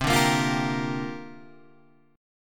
C 7th Suspended 2nd Suspended 4th C down Guitar Open G